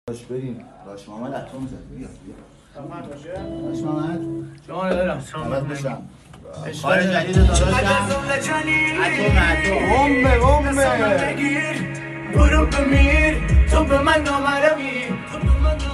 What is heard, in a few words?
kordi songs remix